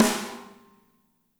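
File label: snare right; Spectrasonics; Burning Grooves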